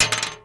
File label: metal; Mafie 1